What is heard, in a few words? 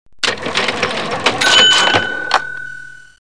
REGISTER; Presentation Partner